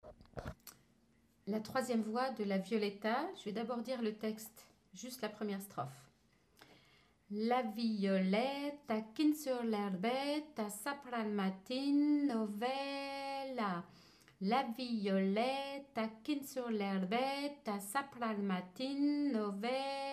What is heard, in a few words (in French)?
3ième voix texte plus chant